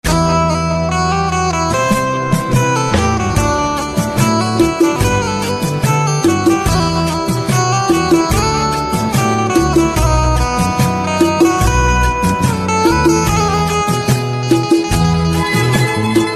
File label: Guitar Instrumental Ringtone